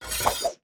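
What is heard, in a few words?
cavalry sword, weapon; Battle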